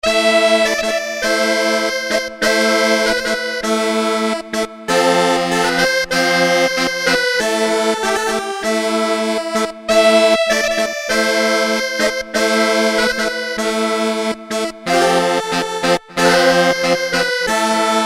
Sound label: Orchestral, Kurzweil K2xxx; K-Works